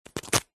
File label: office pen felt tip lid remove